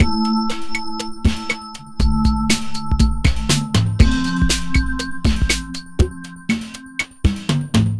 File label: alternative